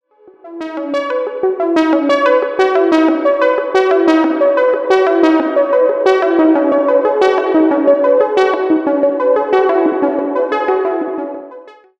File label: plate mod